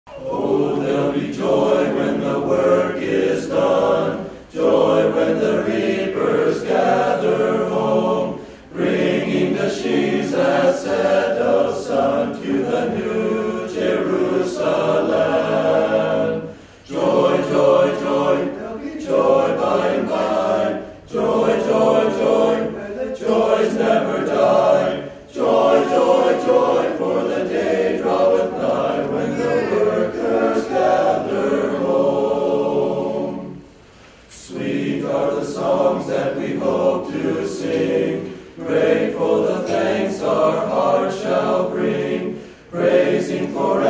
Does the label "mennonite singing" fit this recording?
Yes